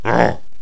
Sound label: mummy ack; megaglest